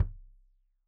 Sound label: Kik Stereo; Deeply Sampled Drums